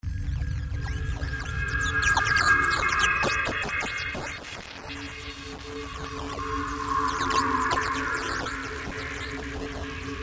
Alien Transmission Static Radio Signal Distortion Scifi; Communications